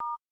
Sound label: dial sound star; BLU G60